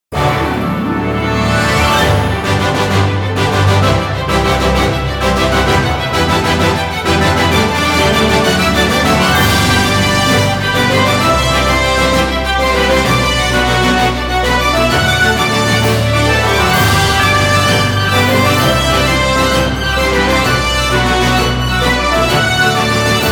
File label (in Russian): Фанфары